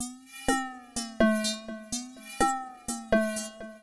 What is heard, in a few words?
HOUSE 125; eJay